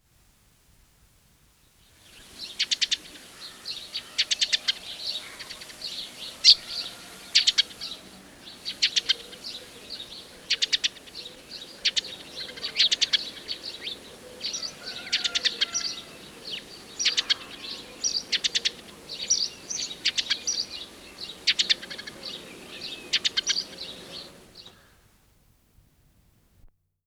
bird; Zomboid 41.78.16; Project Zomboid

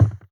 Wood Foot; Zomboid 41.78.16; Project Zomboid

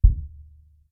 Thump distant; Zomboid 41.78.16; Project Zomboid

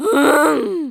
zombie rand; Project Zomboid

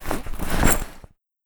Put In Bag; Zomboid 41.78.16; Project Zomboid

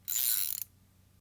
Revolver Spin; Project Zomboid

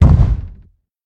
thump; Project Zomboid

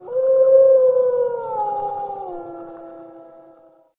Wolf Howl; Zomboid 41.78.16; Project Zomboid

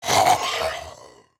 Male Death; Zomboid 41.78.16; Project Zomboid

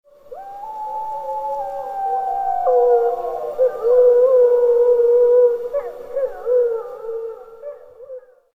Wolf Howl; Zomboid 41.78.16; Project Zomboid

